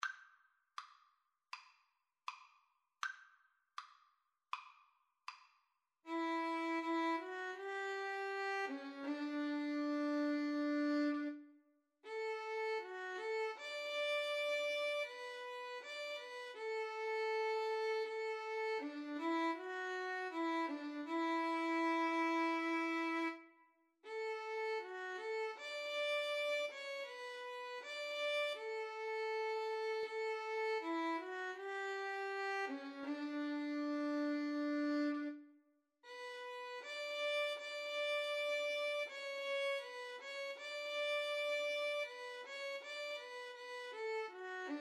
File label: violin cello, Violin-Cello Duet; farewell song